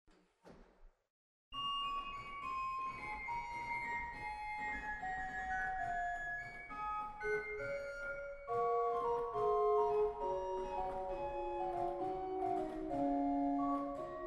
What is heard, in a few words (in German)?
Nassad Qvinta 3, organ; waltershausen